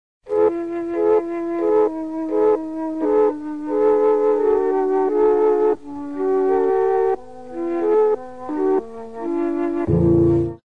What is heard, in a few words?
Mellotron , syntezatory